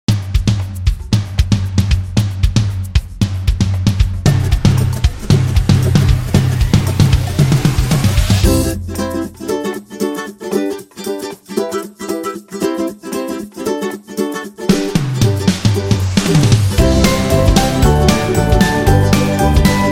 Happiness; happy music vol 2

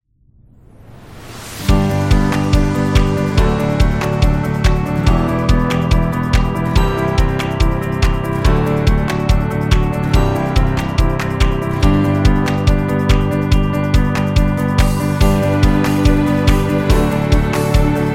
Agency Promo

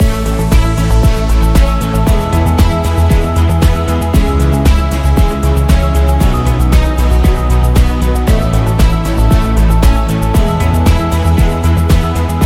Corporate Loop Background

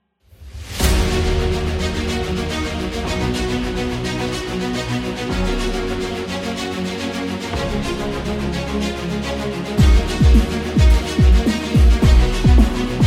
Dubstep Epic; epic music vol 2

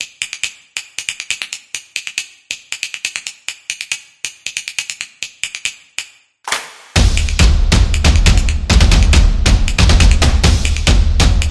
Percussion Intro

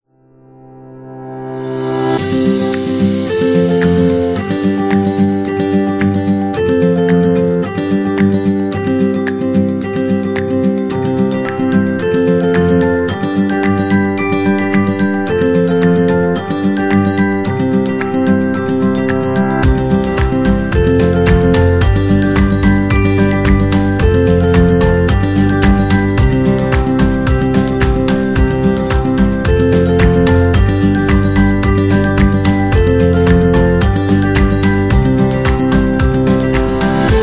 Upbeat Acoustic

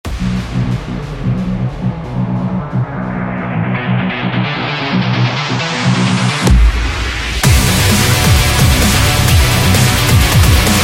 Energetic; electro rock

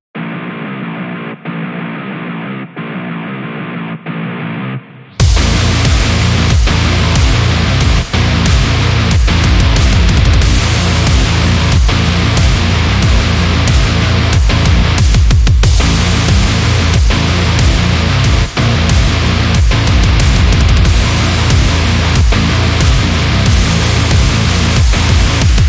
The Metal Alternative Metalcore

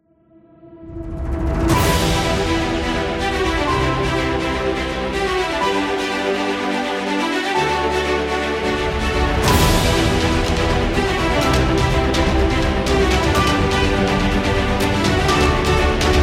Epic Trailer

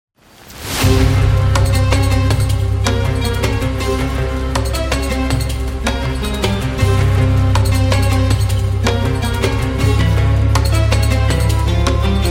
Oriental Metal

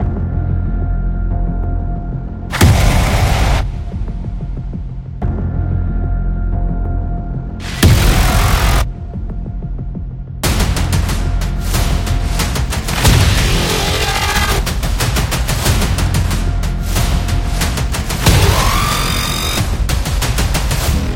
Dark Epic

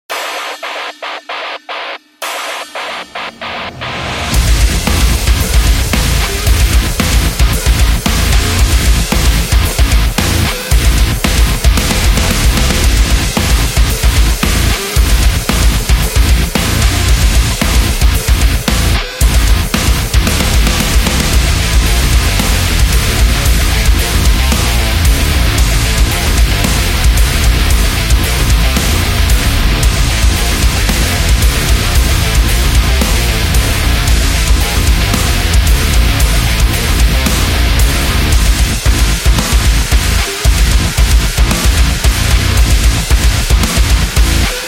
In Metal

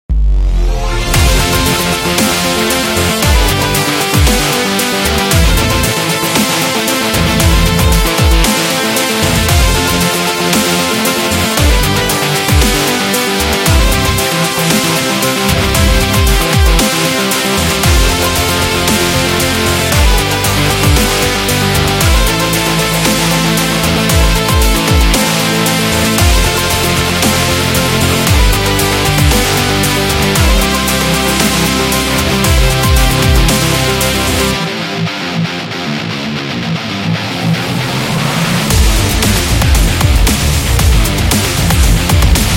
Electro Rock

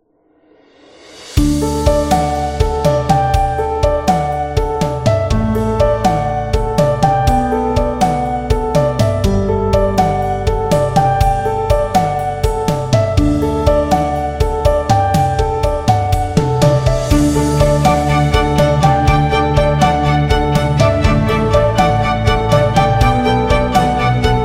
Cinematic Emotion, corporate music; corporate music vol 4

